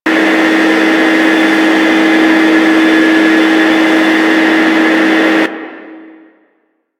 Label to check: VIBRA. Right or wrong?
Wrong